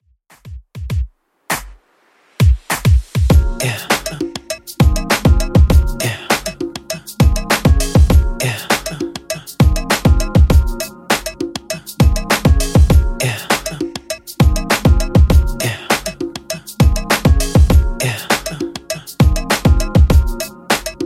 Top40 Redrum 100bpm

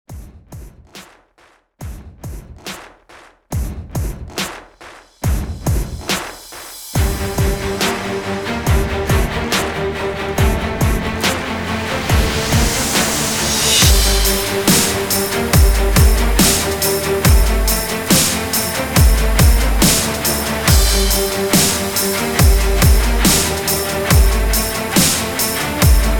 MOVIE SOUNDTRACK 70 BPM

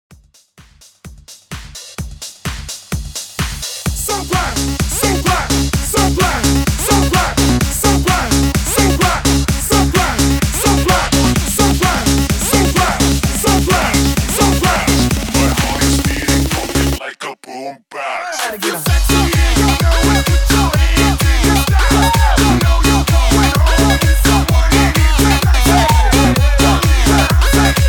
128 BPM

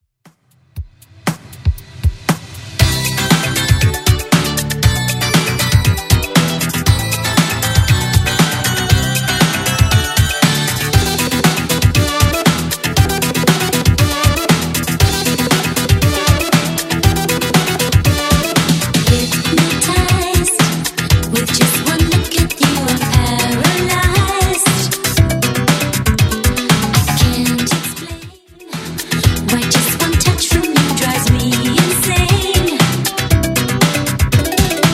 80s Redrum 118bpm

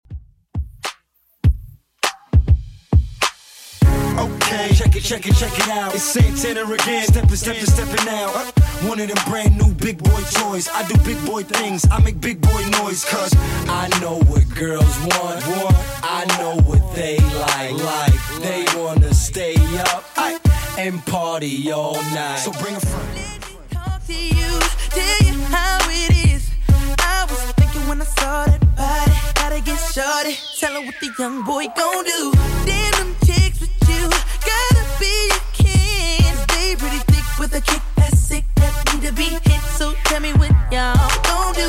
2ks Redrum 101bpm

